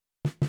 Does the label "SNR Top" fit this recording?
Yes